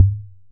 impact Soft heavy

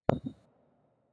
3D Put Down; Martian Chess Audio

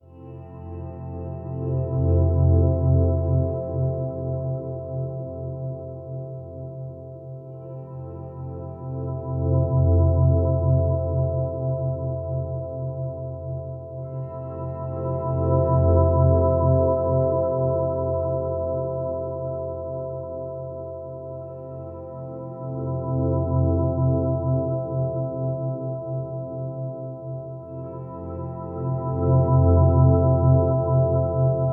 Wash GTR Fast Loop; Martian Chess

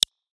Menu Hover; Martian Chess Audio

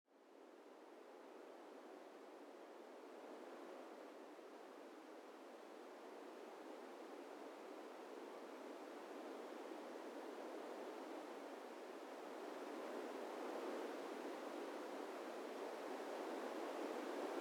Wind; Ambient

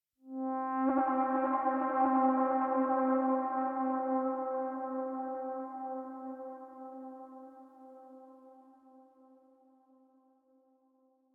Howl; Martian Chess Audio

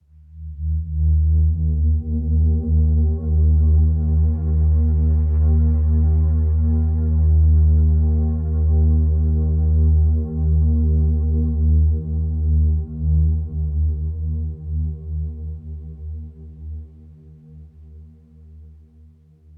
Drone; Martian Chess

